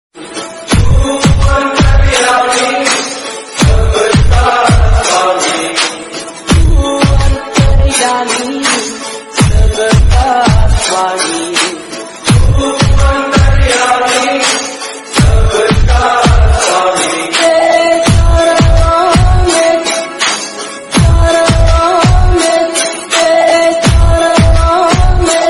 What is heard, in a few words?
Jai Hanuman Remix; Devotional Ringtones